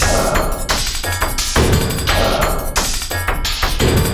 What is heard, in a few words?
Industrial Loops; USB Soundscan vol.24; AKAI